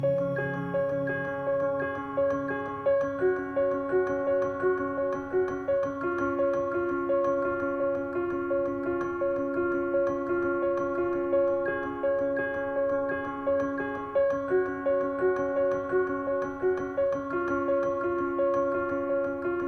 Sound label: Piano